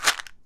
Multivitamin - Shake, Pill Bottles

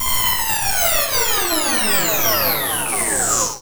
Retro Charge Electric Off Stereo UP

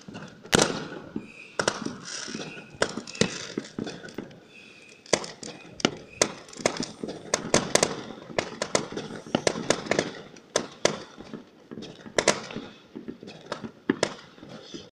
fw loop, bang sfx

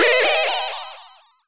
magic

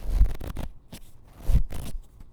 Flipping Pages; BB Retail Therapy Sample Pack

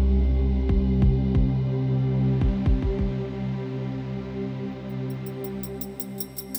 Spooky Pad

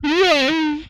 death, vocal; retro arcade; MMRetroArcadeSoundsPack

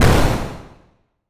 explosion echo

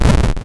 explosion, Retro Sounds 32-Bit

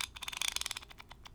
Ratchet Muted; Smol Mechanisms

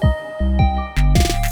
Synth Pluck; BB 2HTC Samples Vol 4; Solos

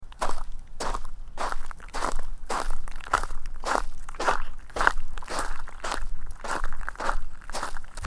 Gravel Footsteps; horror; horror sfx vol 2

